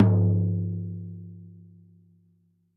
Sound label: tom lo, gretsch kit